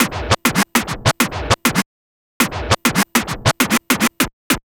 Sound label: Vinyl Scratch Snare